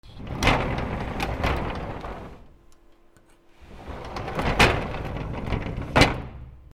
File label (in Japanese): 引き戸, 『シュルル』; K｜フォーリー(開閉); K05 ｜ドア(扉)